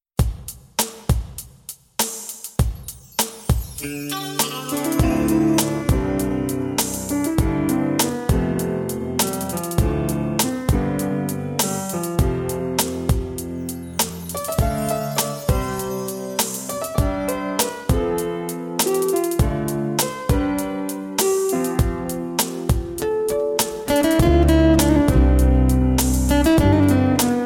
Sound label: piano, 432 Hz; music in action